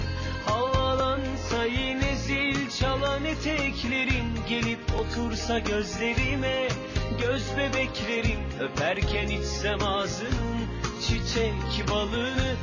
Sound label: Arabic Turkish, ringtones